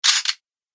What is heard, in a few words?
Loading Space Gun Bullets, laser gun sound effect